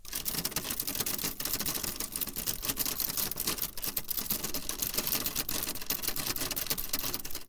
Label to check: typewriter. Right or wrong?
Right